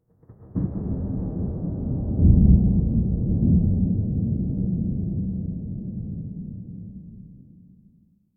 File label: apocalyptic cinematic dee